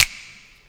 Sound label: clap